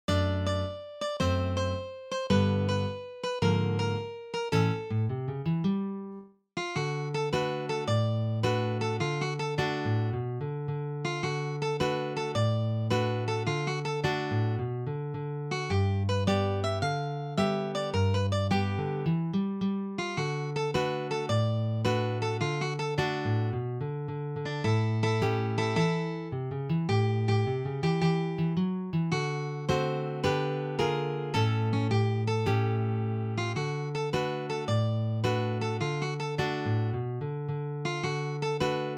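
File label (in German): schlangen blues, Blues Album für Mandoline und Gitarre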